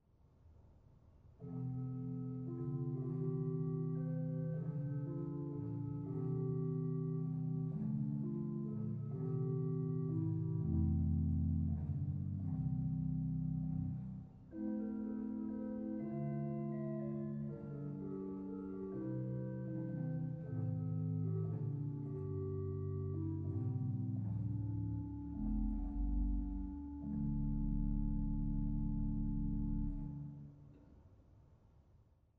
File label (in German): Lieblich Gedackt 16; dortmund; Sauer